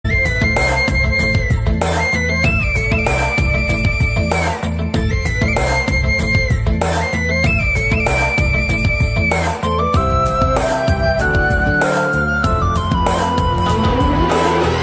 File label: Love Bgm